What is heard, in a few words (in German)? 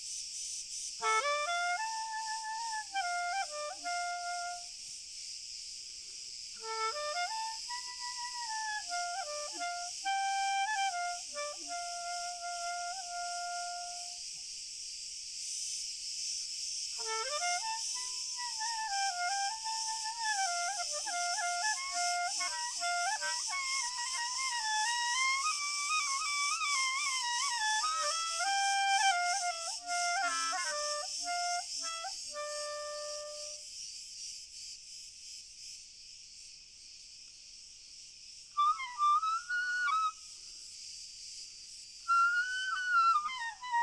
Grillen Chor Stand Mix Fern